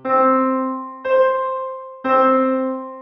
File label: Octave Equivalence (C3 then C4 then unison dyadic chord)